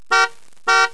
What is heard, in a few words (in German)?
hupe